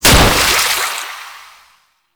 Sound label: Ink Death Health Orb C; Warframe; Follie